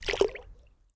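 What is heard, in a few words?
water single gulp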